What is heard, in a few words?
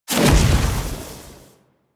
Ship Fire Extinguish; Hazards; Warframe; Railjack